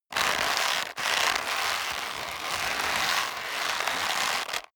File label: leather creak long hard